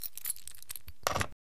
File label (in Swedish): Nycklar